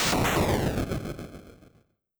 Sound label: lightning magic lowbit